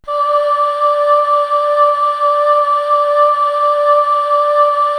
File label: choir; Best Service ProSamples vol.55 - Retro Sampler; AKAI